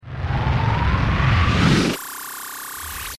bomb, reverse

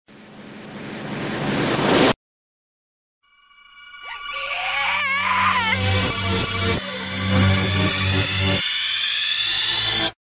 game over; reverse

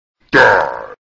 die

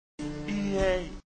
yay

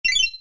pickup

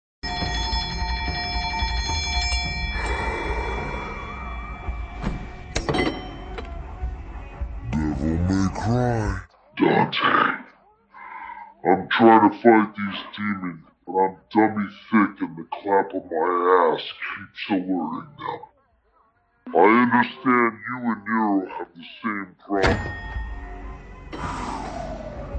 devils cry

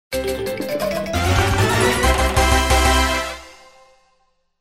victory music; cave

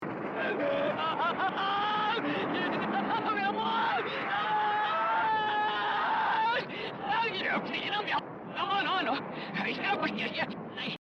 burning money; reverse

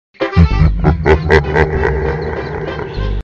laugh

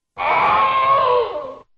wilhelm

